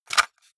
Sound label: cassette case insert hard; objekte; kassette